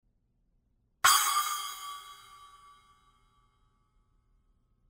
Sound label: ZILDJIAN 8 PROJECT 391 SPLASH